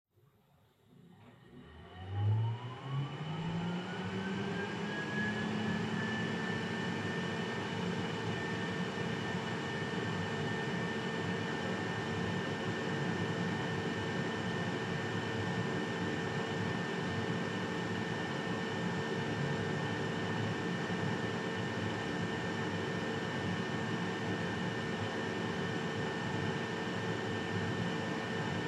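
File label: Washer Front Load Operation Noise Far Dry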